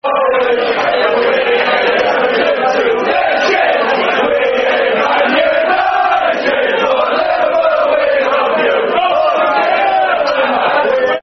We Hate Nottingham Forest, Football Chants (Soccer Songs); Liverpool Lfc